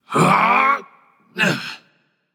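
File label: grunt lift, male; smite; rama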